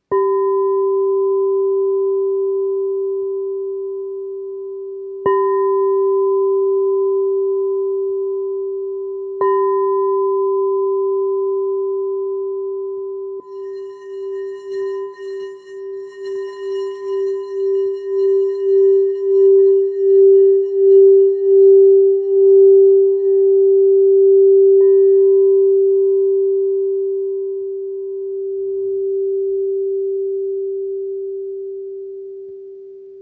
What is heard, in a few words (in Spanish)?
Rosa sol 389hz, cuencos tibetanos